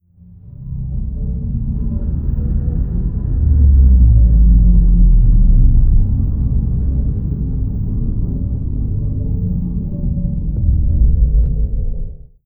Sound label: fallen empire colossus closing; apocalypse